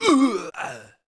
fall, barbarian bow

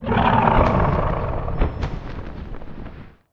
wait, fire dragon

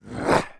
attack, monster; milgyo monster2

